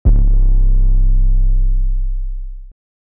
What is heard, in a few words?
808, Bass